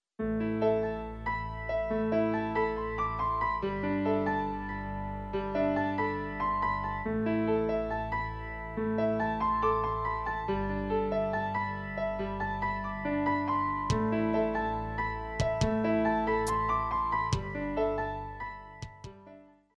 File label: new age